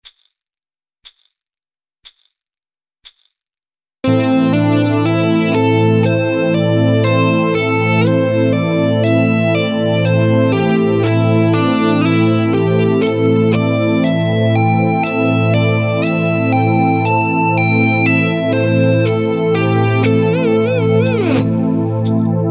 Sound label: LEGATO